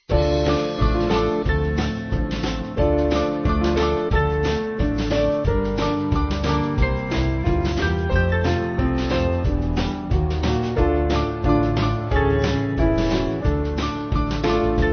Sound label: Band